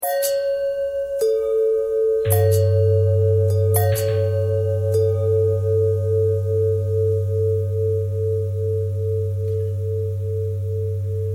bell longbell thread bendthread; bells

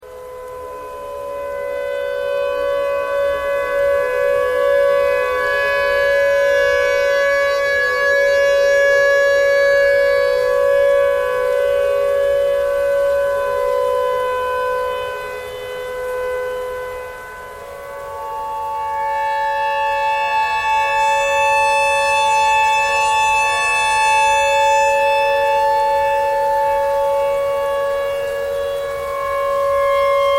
clarinet notes e tornado siren thread noise

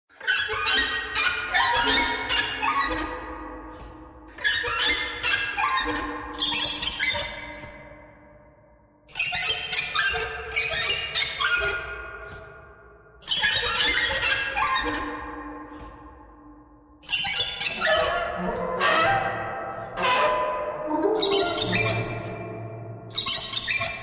birds thread noise

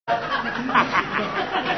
Laugh

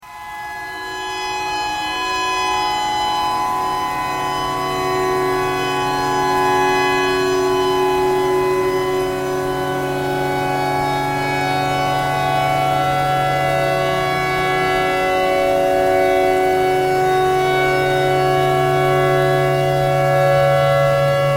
tornado siren thread pentatonic

